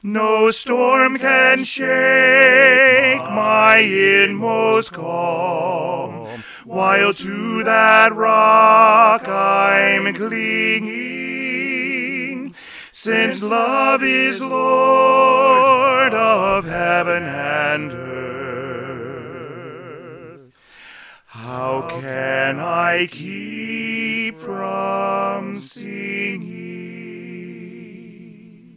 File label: Soprano; All 4 Parts with The Soprano Part Emphasized; McKinney GMC Choir